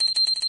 chirp connect

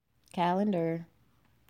Calendar Alert

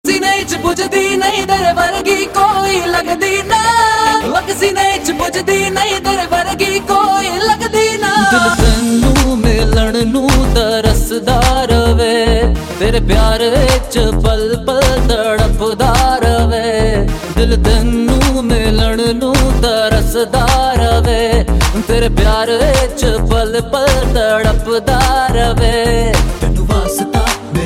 Romantic Song

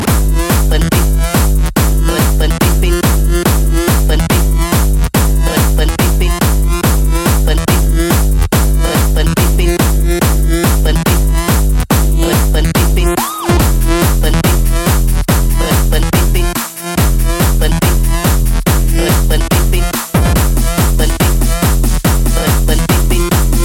Beat

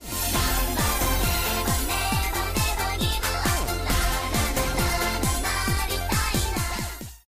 Tone

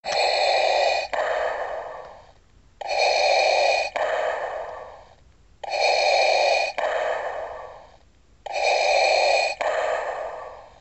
Darth Vader Breath

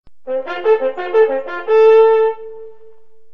Hunting horn

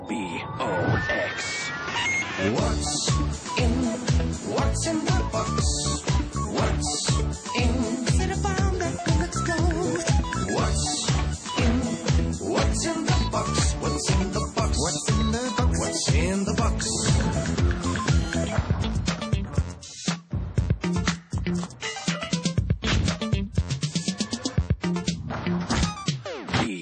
Hahahahaha

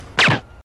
Silencer Shot

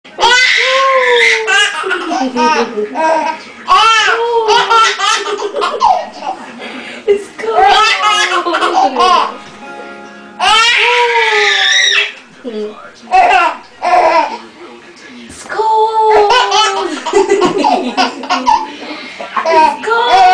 Laughing

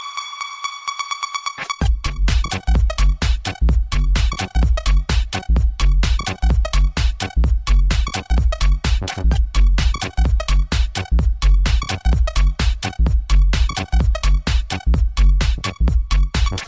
Progressive House

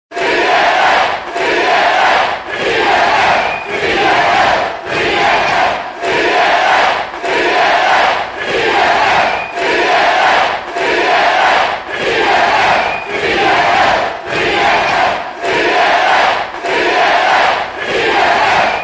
Chants; Tna

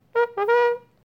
Trumpet

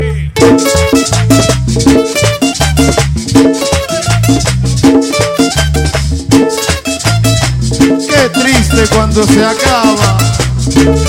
Bachata Mix

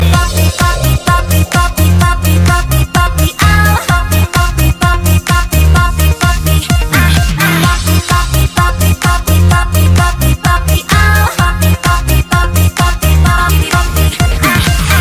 Bo Beep Bo Beep

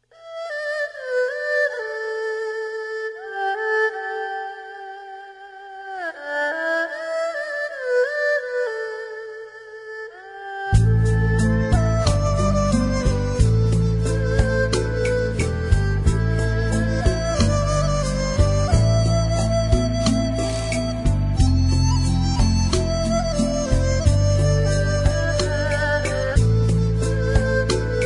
Japanese Tone

